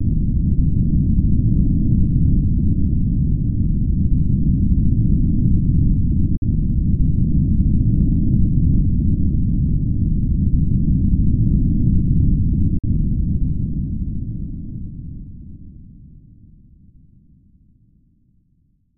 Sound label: Earthquake; benkei gaiden; snes